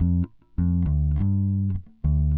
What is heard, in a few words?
Jazz bass, Rock Bass